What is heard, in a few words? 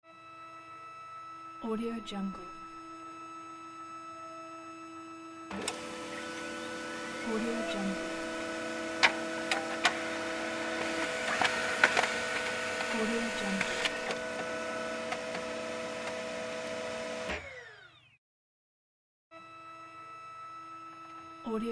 printer scanner